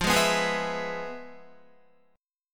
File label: F Minor Major 7th Flat 5th Guitar; FmM7b5 strummed; 12,11,11,13,11,12; 4F,4A,5C,5G,5C,6E